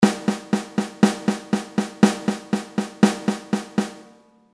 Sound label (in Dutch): Zestiende Noten Snaredrum